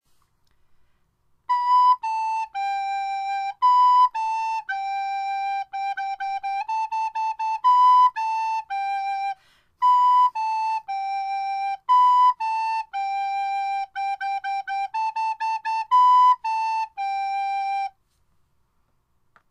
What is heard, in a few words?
hot cross buns